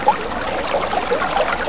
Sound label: water